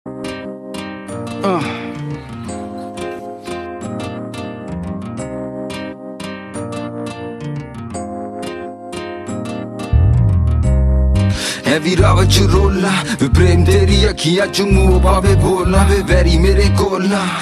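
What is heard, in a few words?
Acapella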